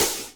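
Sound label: open hi-hat Acoustic Chorus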